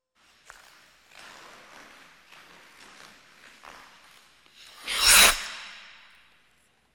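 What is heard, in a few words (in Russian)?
резко коньком по льду; Звуки катания на коньках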